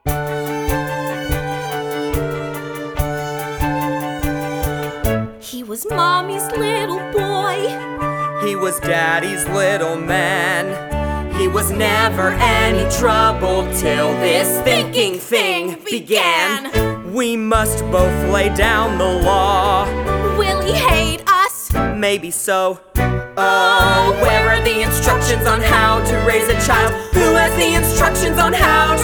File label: vocal